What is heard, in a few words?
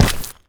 muck run